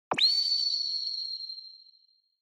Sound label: Flash High Short; Onoma; オノマトペ 光る; 高 短